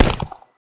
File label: pop; TotalImmersionRacing; XBOX